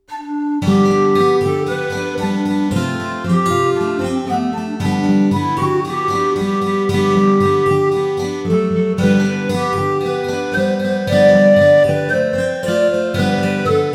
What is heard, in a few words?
The Irish Girl, guitar